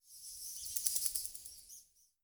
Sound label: Ambience bats; Adam's Venture Origins